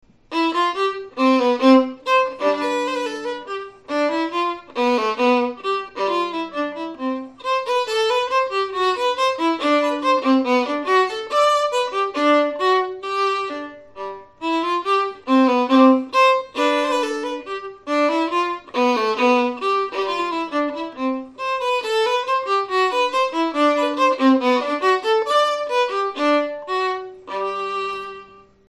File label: Bourree; Bach Bourree; Jazz Band